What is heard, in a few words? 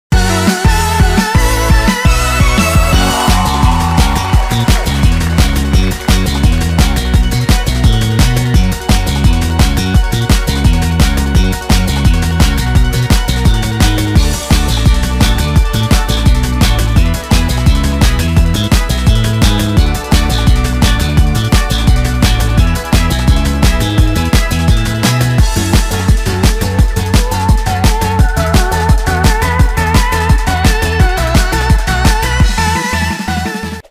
win; fallguys